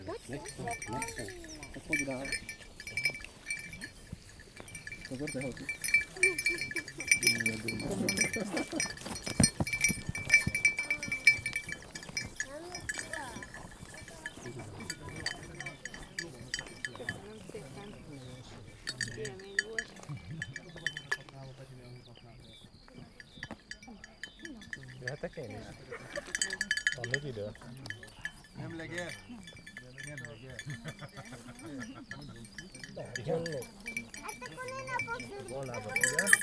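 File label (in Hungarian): elol 3 lo utana 2 az on allat hajto, pusztaotos; hortobagyi puszta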